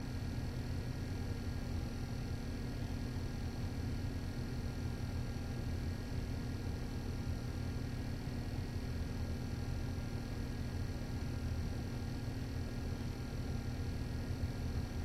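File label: sounds of computer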